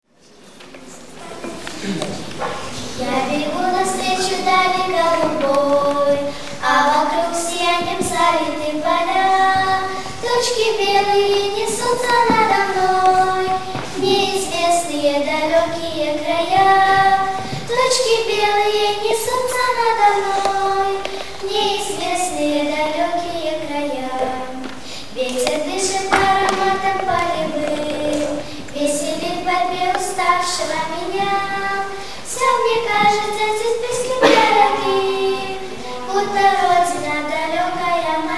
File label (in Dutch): Russisch liedje